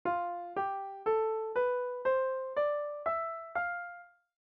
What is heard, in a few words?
F lydian scale